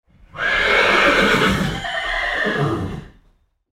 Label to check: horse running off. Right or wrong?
Right